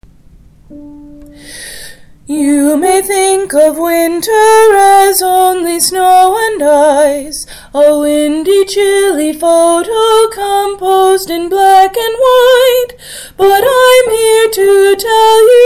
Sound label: colors of winter alto